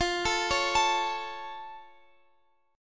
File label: F Augmented; F+ arpeggiated; x,x,15,14,14,17; chord shape